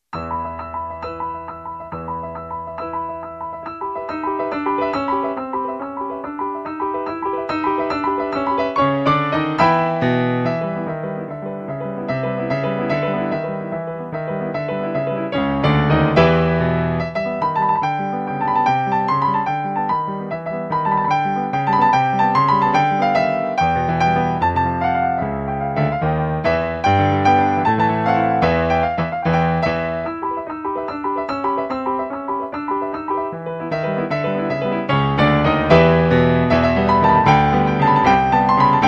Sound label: Piano